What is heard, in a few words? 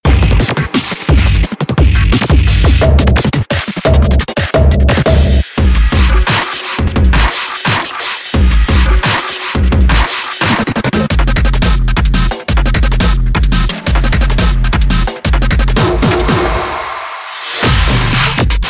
Big Drums